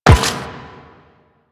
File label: Run Metal, Footsteps; Warframe; Quests; The New War; Kahl